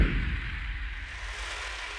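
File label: Music Volcano Light 120; from dust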